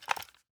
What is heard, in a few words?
movement rifle; gmod; arccw uc commons attachments